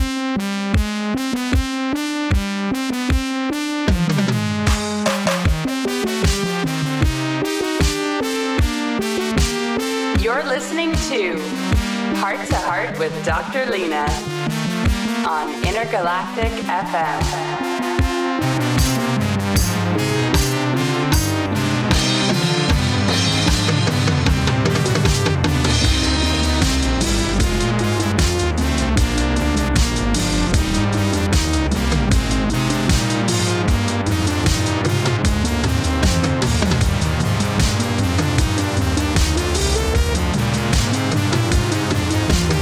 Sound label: Jingle; Heart2Heart; BMX